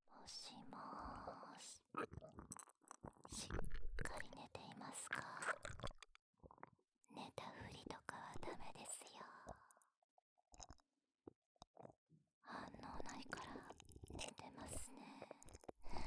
耳舐めされながら、どすけべ巨乳ナースさんに乳首舐め・手コキ・ディープキス・フェラされる音声

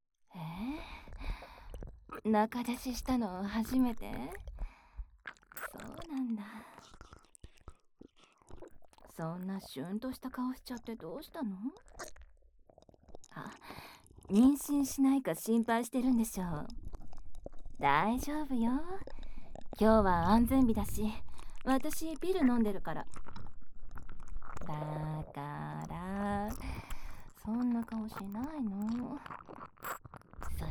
両耳を舐められながら 騎乗位 対面座位 ベロチュー 立ちバック 69 正常位 音; クールな女上司と; キスハメ絶頂おま◯こされる音

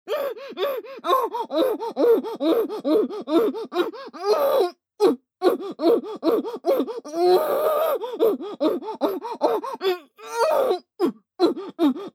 正常位・さらに激しい; あきら-おほ正常位・さらに激しい

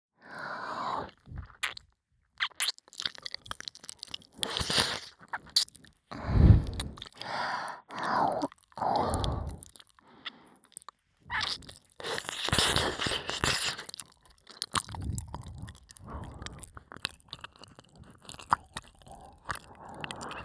耳舐め 左; 夜のひとりごと; 耳舐めオンリートラック

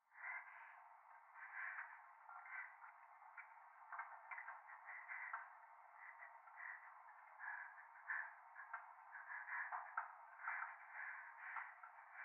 トイレ 右耳快感吐息 電車

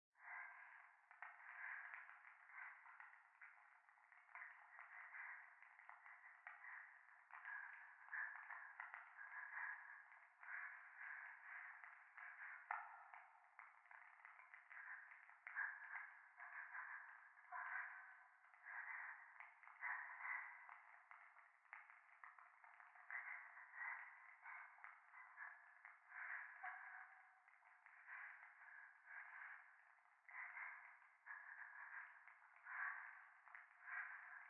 右耳快感吐息 暖炉; 教会

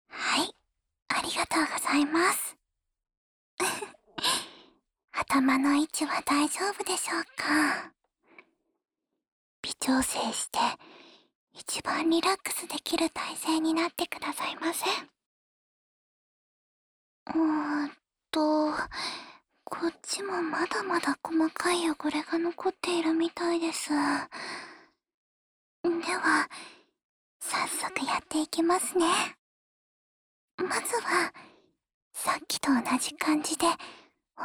ゆったり梵天(右), ASMR

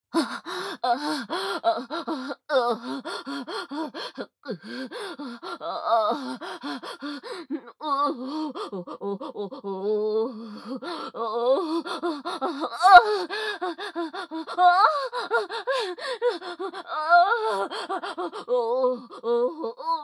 騎乗位・喘ぎ声・激しい

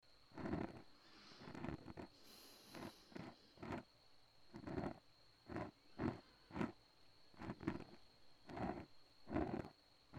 左耳 吐息 耳かき 環境音あり ループ音源; ハクの吐息&寝息

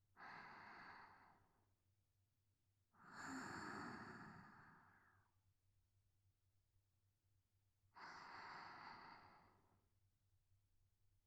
寝息; SEあり

